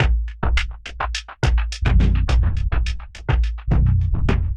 Bins and Barrels Kit - 105 bpm, Drums; MIDI Clips; Ableton; Mood Reel

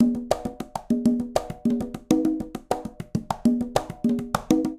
Salsa Conga Tumba 200bpm; Latin Percussion; Ableton; Factory Packs

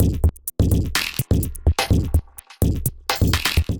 Kit groove 126 bpm; MIDI Clips; 122 - 130 bpm; Snake Paw Kit; Glitch and Wash; Ableton

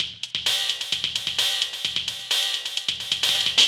Dubstep 70bpm, MIDI Drum; Ableton; Factory Packs

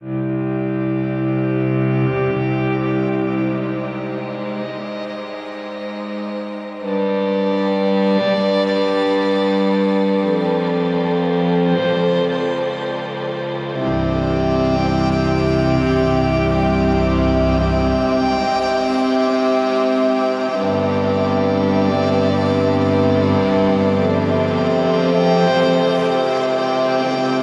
Walkaway Strings - C Phrygian 70 bpm; MIDI Clips; Tonal; Motifs; Ableton; Mood Reel

